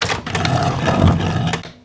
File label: touchplate stone big